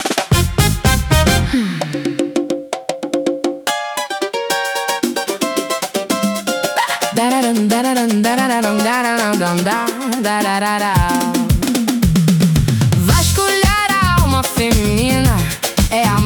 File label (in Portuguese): Samba Soul